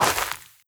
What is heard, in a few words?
scuff, footsteps player grass